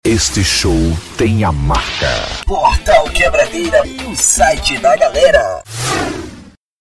abertura; portal quebradeira

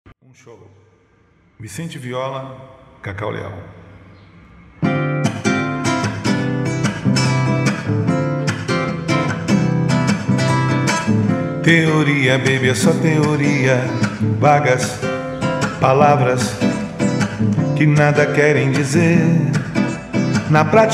viola

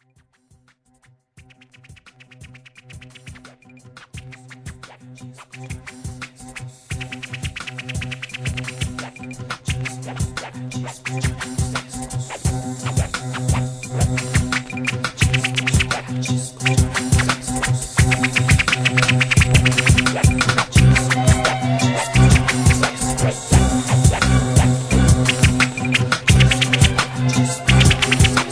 discos toca disco

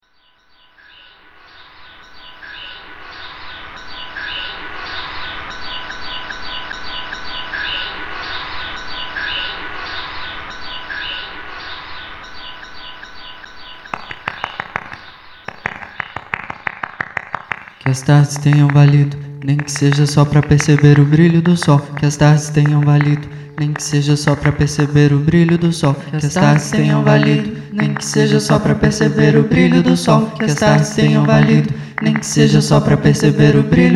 mantra

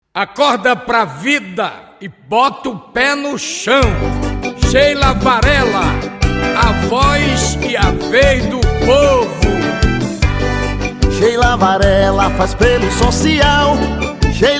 jingles de divulgacao versao arrocha

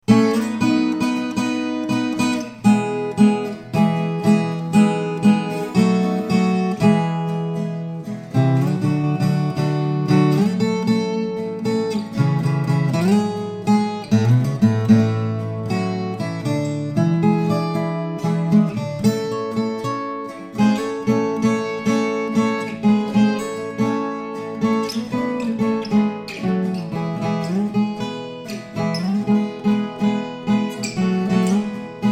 Viola instrumental

